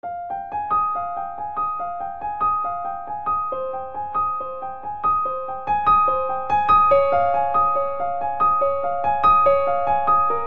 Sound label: Ostinato Cinematic i - v - VI - iv F Minor 70 bpm, Grand Piano; MIDI Clips; Ableton